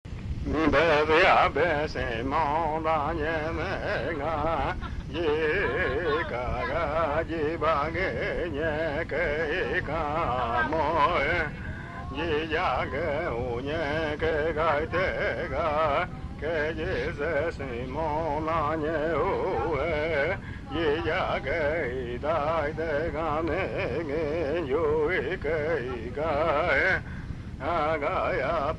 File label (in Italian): canto, Ayoreo